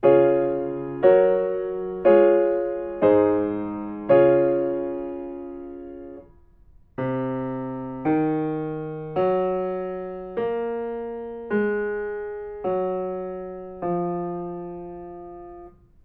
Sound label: melodic fragments